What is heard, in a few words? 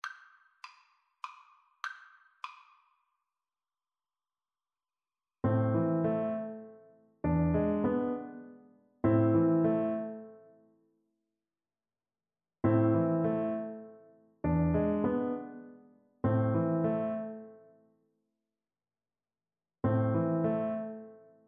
baritone, Baritone Horn